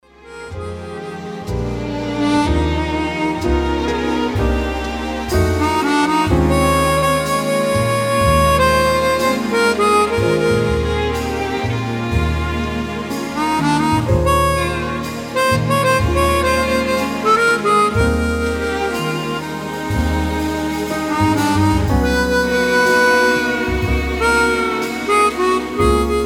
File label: Christmas Song